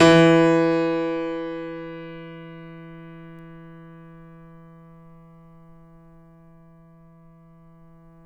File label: FF E 6 off, Grand Piano off mic; AKAI S6000 CD-ROM - Volume 2